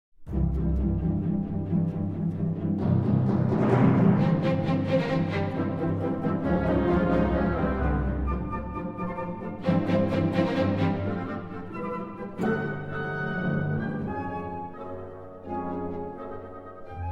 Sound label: Symphony in E Minor